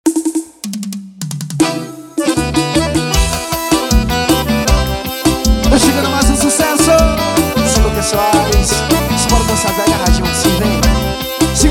forró

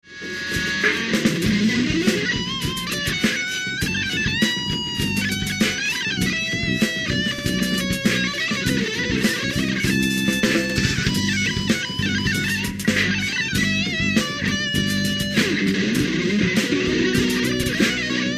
lundum improviso ao vivo na radio

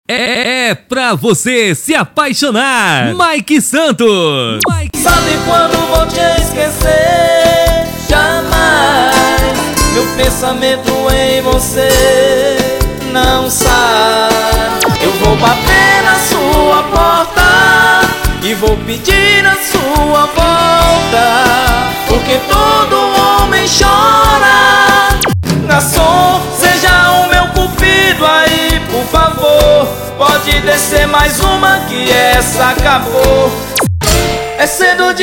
abertura

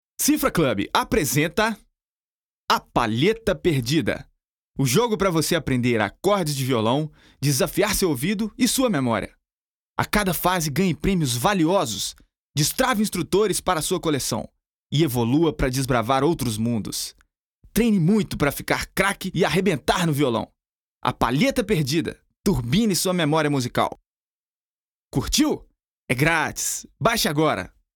samba

